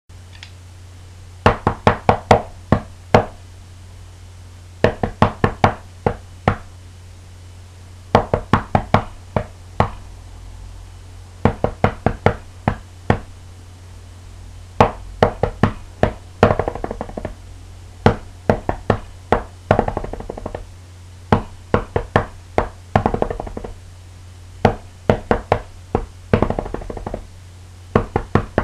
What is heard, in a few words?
perc